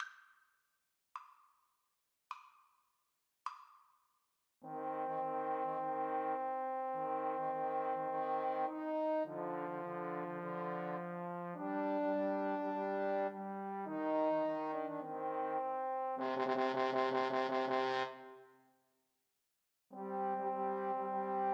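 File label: summer 2nd movement four seasons, Trombone Trio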